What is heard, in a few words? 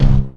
DM BAS, Dance Mega Synth